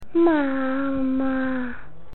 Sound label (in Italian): sonno